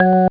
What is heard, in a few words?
Organ (F#3); More Lemmings